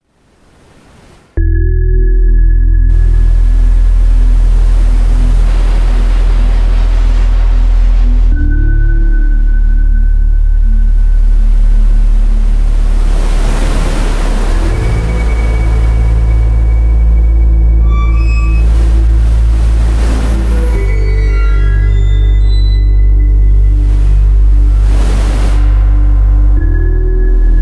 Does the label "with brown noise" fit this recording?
Yes